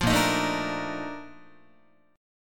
Bb Major 11th Bb Guitar; strummed

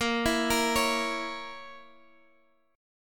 A sharp Diminished A sharp, Guitar Half Step Down

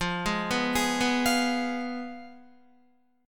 F Diminished Guitar; Fdim arpeggiated; JGuitar

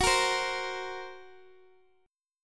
F sharp Major Flat 5th F sharp, Guitar DADGAD; chordshape; down

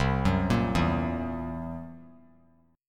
C 7th Flat 5th C down, chord; C7b5 arpeggiated; JGuitar

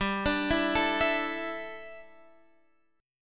D Suspended 2nd Suspended 4th G

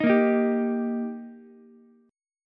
D sharp Major D sharp Ukulele, chord; strummed; JGuitar

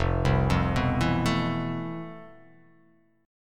F Major Sharp 11th; arpeggiated; chord; FM#11; 1,3,3,4,4,4,x,x; JGuitar

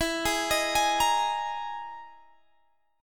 E Major Sharp 11th, Guitar, Standard; EM#11; arpeggiated; x,19,18,20,21,18; down; capo=3

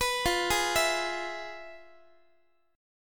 F Minor Major 7th Flat 5th Guitar; FmM7b5; arpeggiated; x,x,14,15,12,15

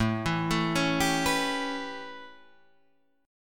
A 9th A, Guitar Open D

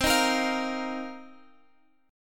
C Minor 11th C Guitar Open F; Cm11 strummed; x,x,12,10,13,12

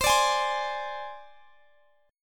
C Suspended 2nd Sharp 5th C Guitar Open G; down; chord shape

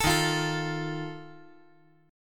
D sharp Suspended 2nd Flat 5th, chord; strummed; JGuitar

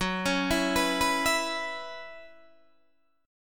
F sharp 7th Suspended 4th, Guitar, DADGAD

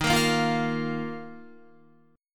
D sharp Suspended 2nd, Guitar; D#sus2; strummed

